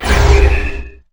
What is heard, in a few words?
Scifi Dome Shield Activate; Energy & Forcefields